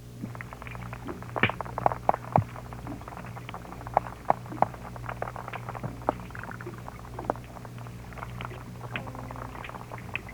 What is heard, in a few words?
galeichthys felis; Percolator sounds attributed to Arius felis, 10 June 1965, Charleston, SC. Bandpass filter 100 to 2400 Hz.